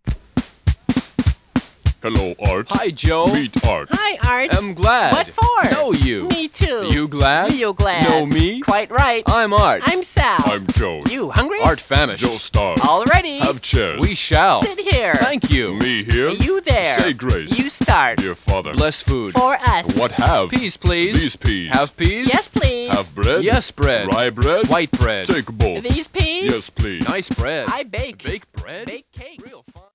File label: Rap